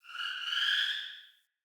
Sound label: Cave Ocean Creature Close